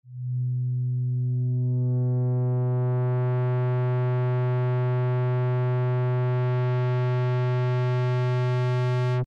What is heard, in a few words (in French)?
Exemples defauts sonores distortion harmonique